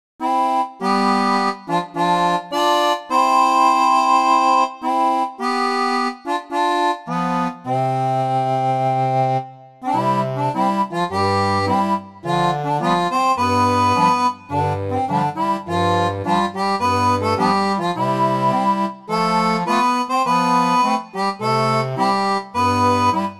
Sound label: seraphone